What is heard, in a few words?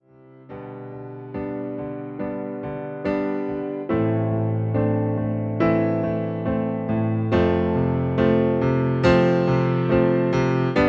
Piano Remix